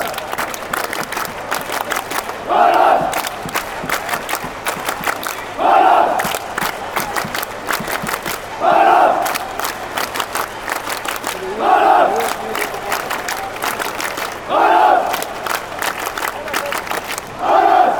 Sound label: clap fanchants; randers